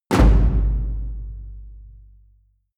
drum hit loose small; percussion

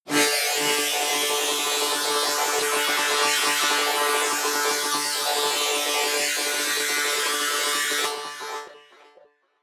cinematic resonator string; energie

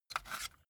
cassette case take out soft; objekte; kassette

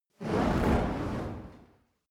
fire torch whoosh long soft

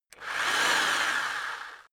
rock drill rev reverse medium; maschinen; bohrer

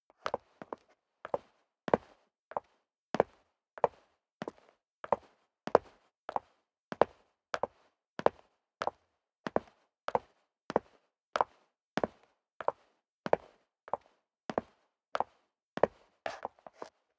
step leather shoes concrete downstairs sequence

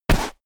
step walk sand; schritte

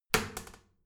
toilet seat wall open heavy, badezimmer; haus-garten

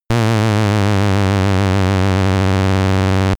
synthetic signal; energie

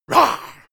beast growl high, comedy cartoon; soundpool

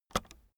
keyboard external space release soft, computer

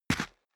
step boots concrete grit single right; Footsteps; schritte

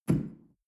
shell grab from tablecloth medium; soundpool

